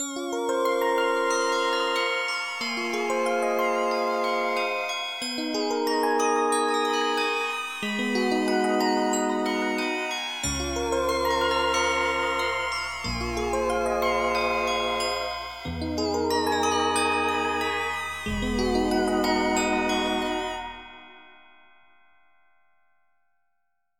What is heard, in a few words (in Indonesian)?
Nada Dering Nokia Jadul Hushed